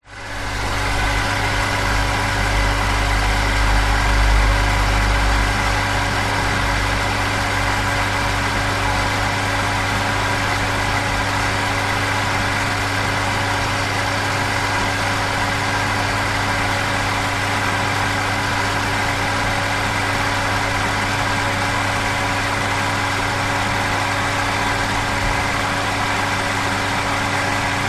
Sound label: industrial swimming pool filter pump long burst